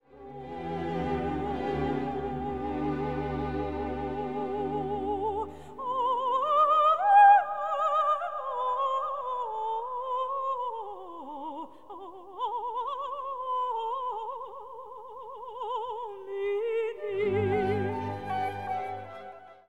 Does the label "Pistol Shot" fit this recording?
No